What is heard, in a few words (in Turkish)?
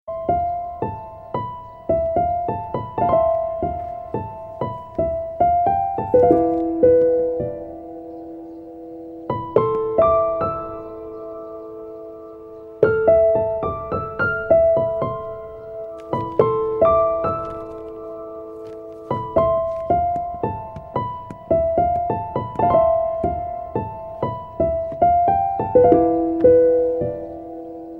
Duygusal